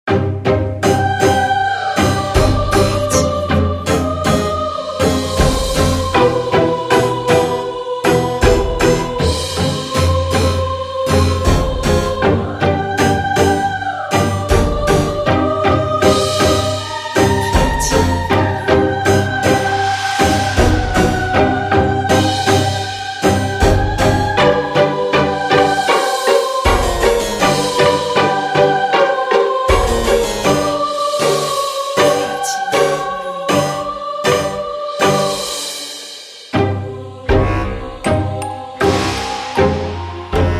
pizzicato choeur

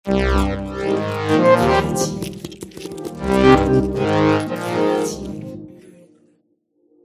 effets synthé

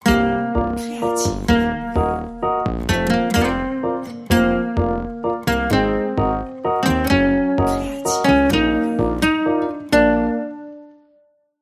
Old Village Feast guitare espagnole

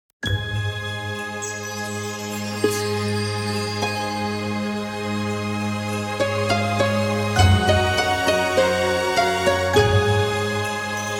harpe orchestre; feerique onirique

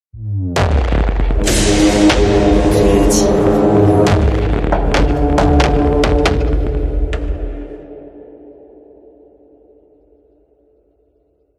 percussions effets

